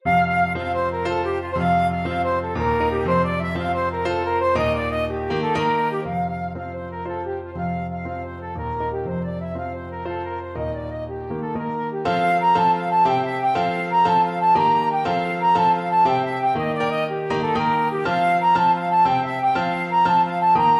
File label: flute